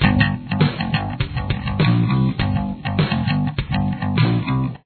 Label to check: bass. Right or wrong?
Right